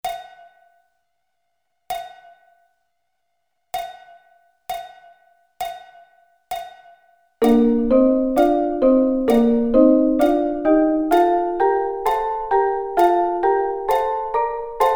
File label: Bb Double Stops